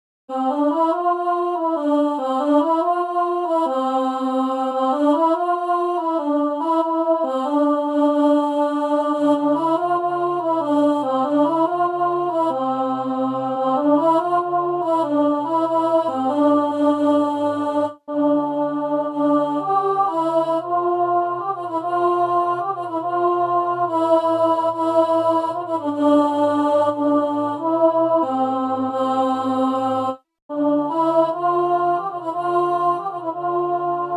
Altos

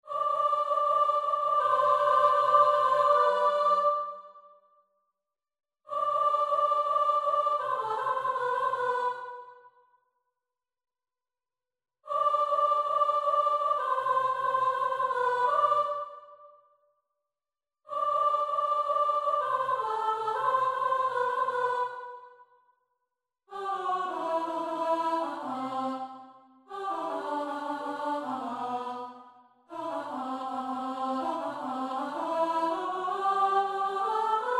chorus Descant

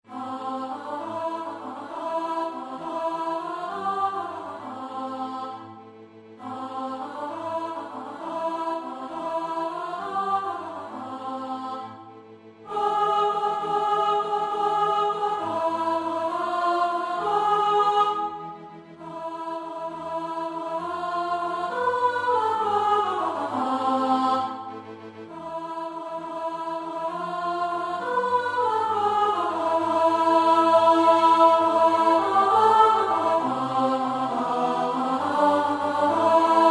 Panxolina Alto

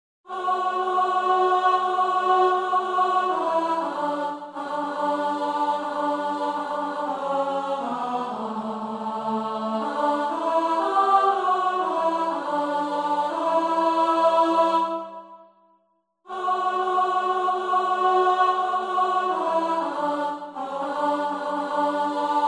Soprano

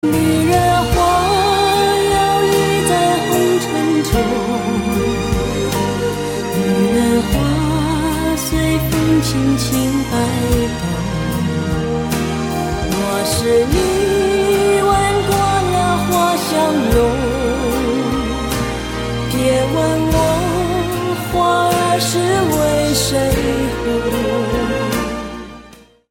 Chorus

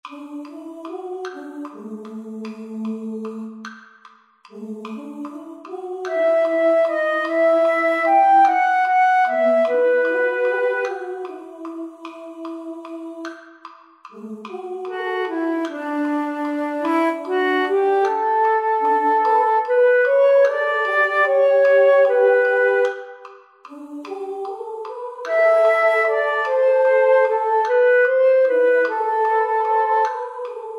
Flute

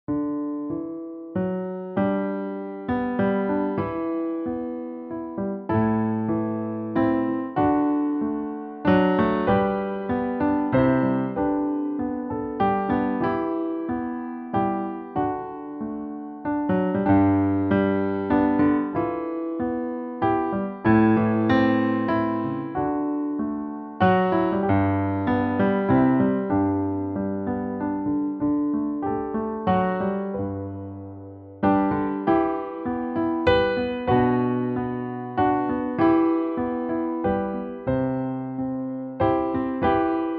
The Banks of the Tyne Piano

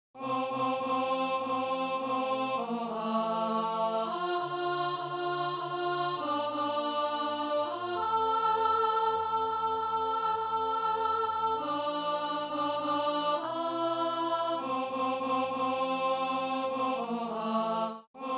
alto

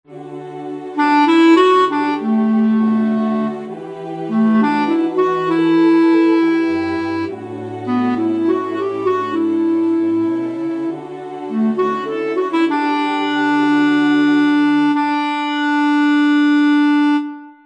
Clarinet Coda

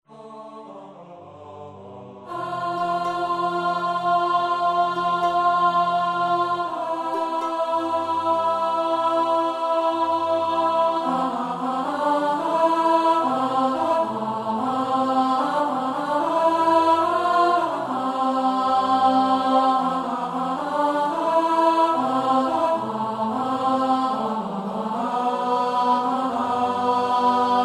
Alto

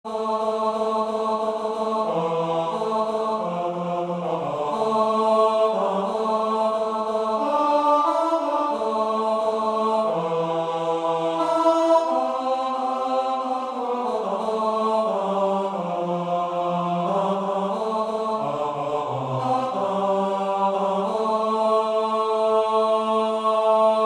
D Bass

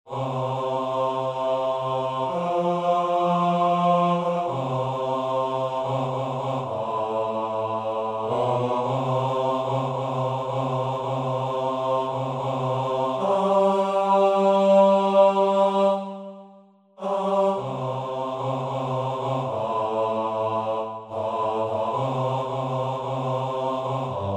Basses

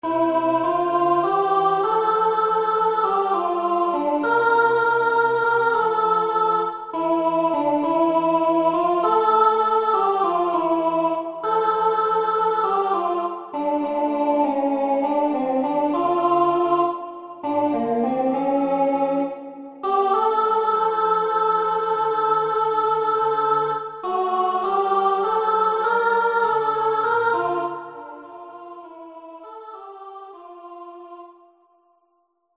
Chorus Alto

